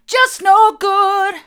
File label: just no good mid, vocal